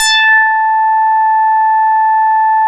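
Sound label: ROLAND JX8P harp brass A5; Big Fish Audio; First Call Vintage Keyboards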